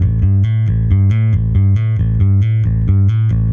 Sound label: EBass Brdg Fing 136 A2; musicradar; bass boutique